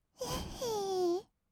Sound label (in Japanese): "えへー", kawaii voice; 1200 kawaii voice!!